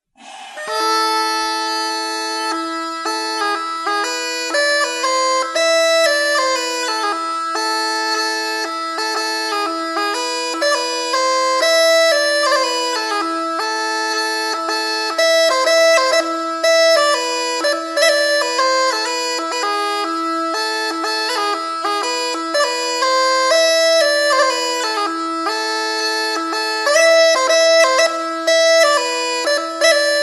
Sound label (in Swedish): sackpipslat, bagpipes swedish